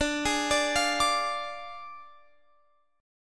D Diminished Guitar Open F, chord; x,21,20,21,20,21; Ddim arpeggiated

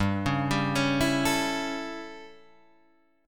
A 7th G, chord

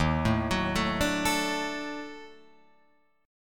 Eb Minor Major 7th Flat 5th Eb down Guitar Open F; EbmM7b5; arpeggiated; JGuitar

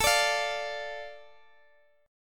A Suspended 4th Sharp 5th down Guitar DADGAD

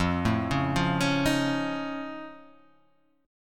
F Augmented 7th F, chord

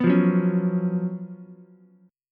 Gb Major 7th Suspended 4th Gb Ukulele; GbM7sus4; strummed; JGuitar

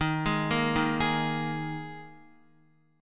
Eb Suspended 4th Eb Guitar 4E 4A 5D 5G 5C 6F; Ebsus4 arpeggiated; JGuitar

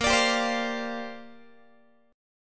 A sharp 6th Add 9th, Guitar; A#6add9 strummed; JGuitar

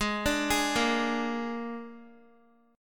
Ab Suspended 2nd Flat 5th Ab Guitar; arpeggiated; JGuitar

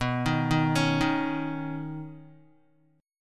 B Major Add 9th, Badd9 chord, Guitar; arpeggiated; JGuitar

